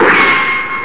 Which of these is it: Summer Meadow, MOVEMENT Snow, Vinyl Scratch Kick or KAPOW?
KAPOW